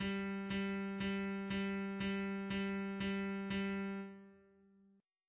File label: bass 4 beats